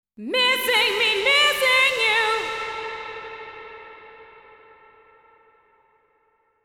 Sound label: voice girl